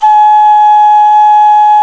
D OCRNA, CLAYFLUTE; Africa; Spectrasonics; Heart of Africa 1

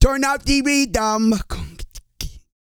REGGAE, Vocal Planet World Voices

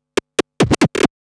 Scratch Fills 140BPM

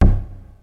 CHIP BASS, Peavey DPM; Rubber Chicken Software Sample Bank

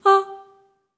STACC G4, Symphony of Voices, SOP STACC

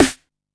Snare, Drum & Bass

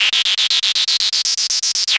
Techno Synth

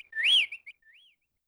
Tweet, YAMAHA RM1X

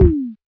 Rhythm Kick Tom, Realistic Concertmate-670; Rhythm Layered Kit

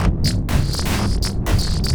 Trip Cloud, Noise Percussion, Ethereal Textures; Sonic Foundry (Sony Creative Software); Ma Ja Le

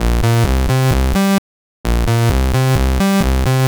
Synth, 130bpm, Nu Dance Electro; Zero-G; Soundclash; Combi Loops; Thrasher

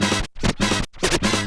scratches; Akai MPC-500; Perc

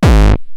Roland TR-909 BD; BD Selection

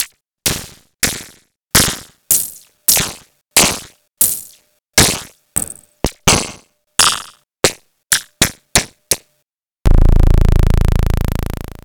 Weird Hats

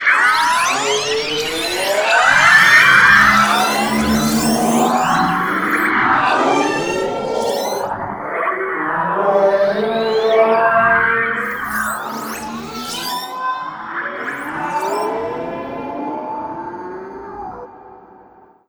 BELUGA; USB Soundscan vol.33; AKAI; Disjointed Textures

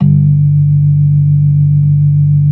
B3 G 3; Best Service; Hyper Dance

